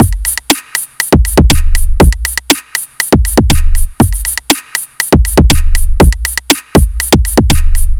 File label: Boxed Room Beat, Drum Loop; Sonic Foundry; The Electronic Music Manuscript Collection; Drum Loop Structures